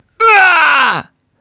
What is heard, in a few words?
enemy die; Wild West Shootout (Arcade) (gamerip) (2019)